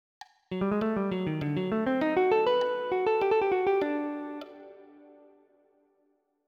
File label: Lick